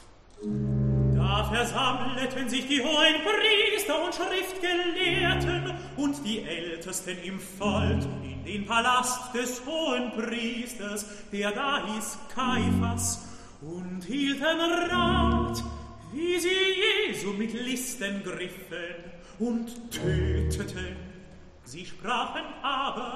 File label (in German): Rezitativ; Johann Sebastian Bach; Pasja wg sw. Mateusza BWV 244